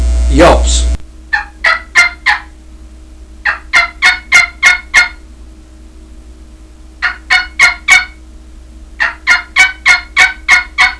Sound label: dbl yelps, turkey; dixie